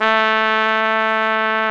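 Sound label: BRS AKG TP, Brass Trumpet f; Roland L-CDX-03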